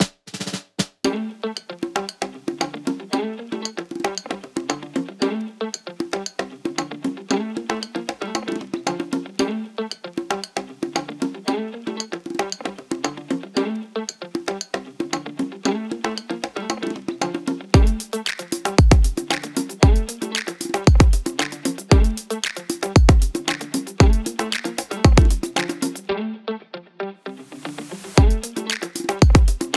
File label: New Day Pop 115 BPM